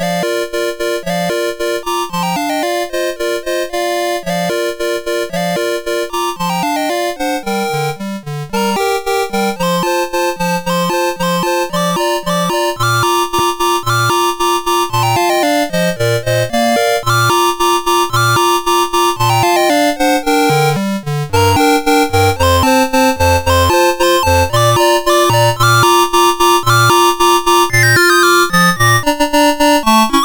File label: Turkish March, MOS6581R4